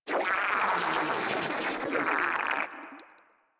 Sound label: Frogman Death; Vocals; Warframe; Grineer